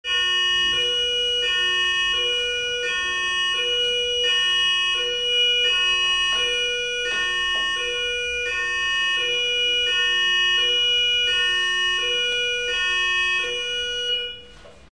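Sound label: ambulance driving with siren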